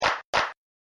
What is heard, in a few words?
claps